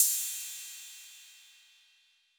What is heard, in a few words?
Cym B 808 Decay B